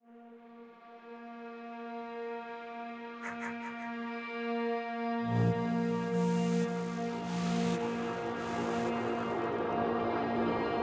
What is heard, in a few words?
Tension Background; The Park